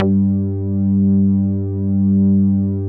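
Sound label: ATTACK BASS G3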